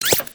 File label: Broombot Idle Thick; creatures mechanical; Inventor